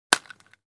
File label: Egg shell crack break kitchen